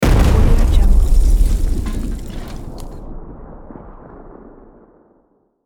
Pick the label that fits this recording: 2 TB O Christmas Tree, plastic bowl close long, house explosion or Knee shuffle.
house explosion